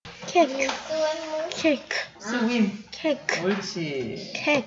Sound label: kick